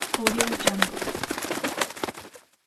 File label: wings flapping